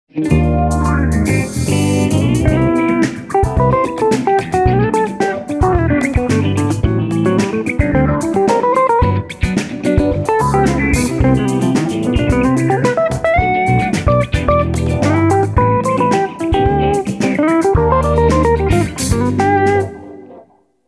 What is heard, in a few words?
guitar solo